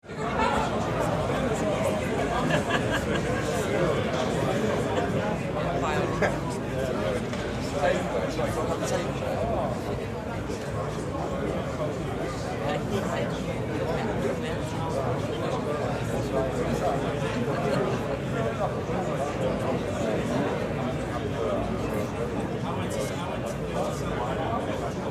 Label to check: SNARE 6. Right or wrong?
Wrong